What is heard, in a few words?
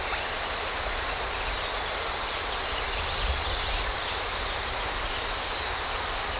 Water